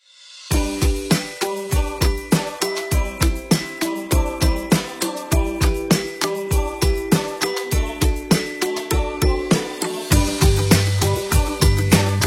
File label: Children Fun; Royalty Free Music Pack By AudioCoffee